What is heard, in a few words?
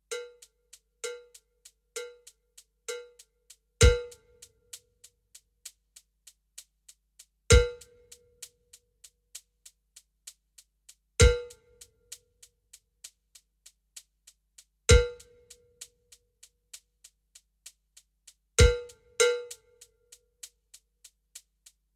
standard 12 bell trainer slow; rhythmic perspective; chapter 4 rhythmic perspective issues